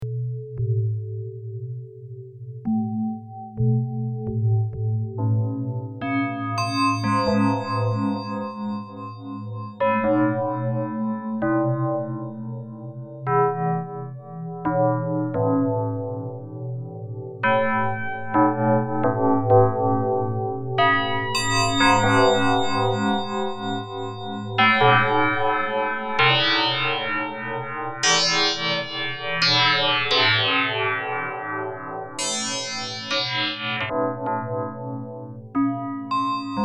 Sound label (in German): Reface DX Glockensterben